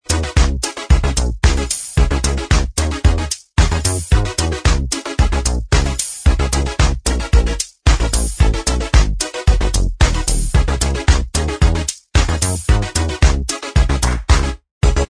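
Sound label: instrumental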